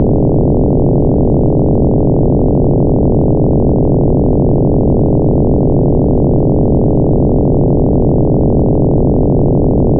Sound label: engine rumble